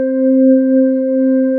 lightbulb voltage note c 4; faradays law

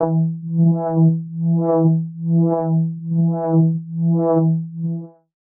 rotation counterclockwise; greenhouse effect

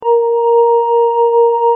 lightbulb Voltage Note B Flat 4; faradays law

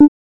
energy Balance Blip; greenhouse effect

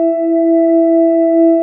lightbulb voltage note e 4; faradays law

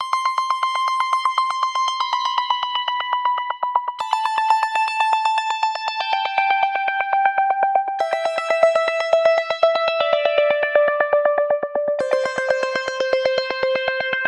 High Descending Ping Repeats; quad Track

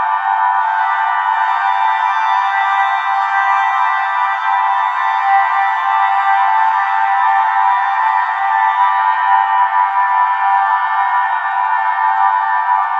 color Vision High Ambience

